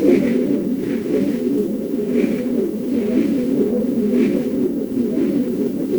crowd murmur

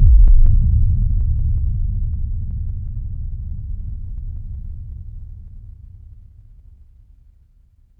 horror heartbeat

